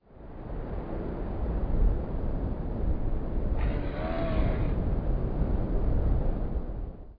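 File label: desert ambience; Real War: Air Land Sea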